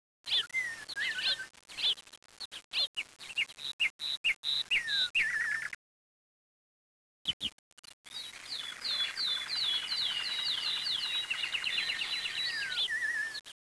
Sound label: hawk, ambience